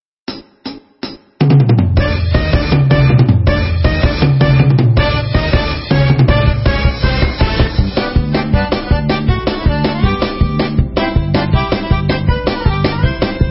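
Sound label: Hora Instrumental, Israeli Dances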